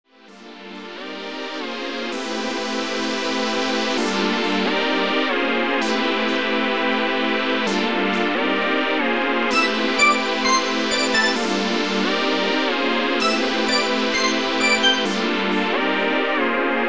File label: beat engine